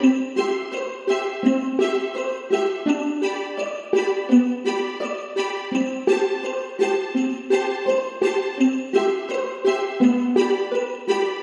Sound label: Magical Merry Christmas, Strings and Jingle Bells Loop